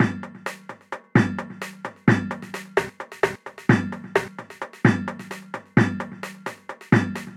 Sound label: Jungle Beat 172bpm, MIDI Drum; Ableton; Factory Packs